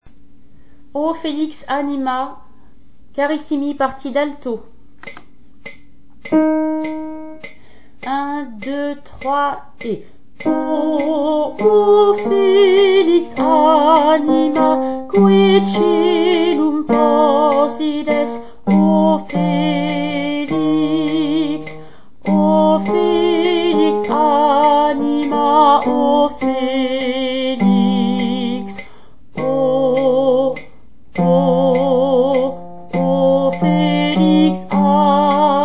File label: alto